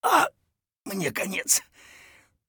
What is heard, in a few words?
hurt near death; Escape from Tarkov; scav1